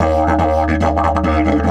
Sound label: EF 140, DIDGE RIFFS